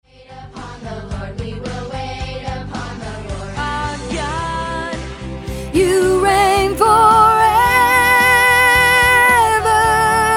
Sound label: 3rd above